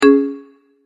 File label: multimedia tone exclamation; McKinney Sound